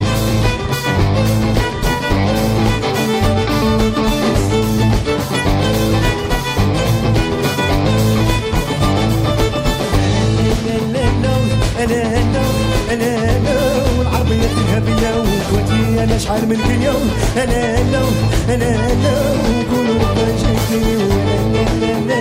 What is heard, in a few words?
moroccan gospel ringtone